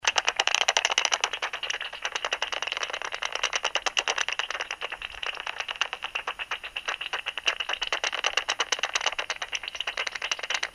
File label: bat calls